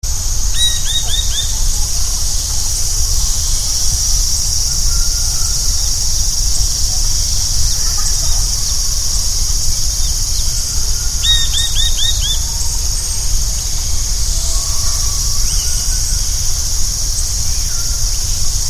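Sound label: blue-eared barbet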